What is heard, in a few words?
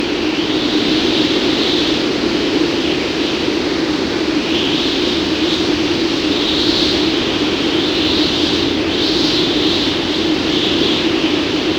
Urban Wind Loop; gamerip; Hamsterdam; android ios macos mobile switch windows